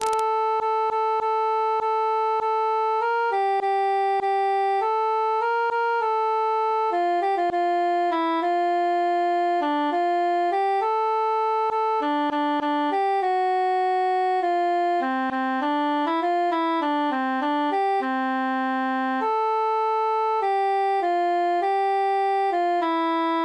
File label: altus solo; fine knacks